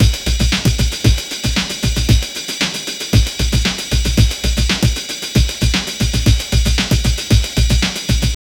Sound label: amen break 115 bpm